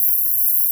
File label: KOR HP F4; Analogon